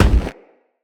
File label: Crewman Footstep Walk; Characters; Corpus; Crewmen; Footsteps